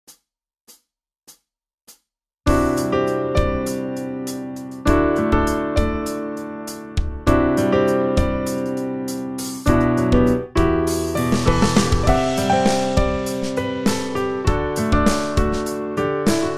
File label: studio grand close mix; xln audio